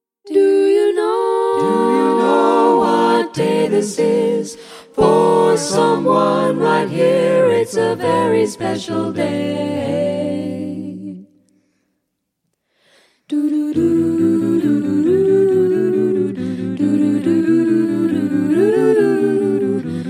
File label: Happy Birthday Blues, vocal group